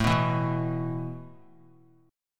A Suspended 2nd A; Asus2 strummed

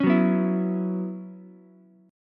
F sharp 7th Suspended 4th, Ukulele; F#7sus4 strummed

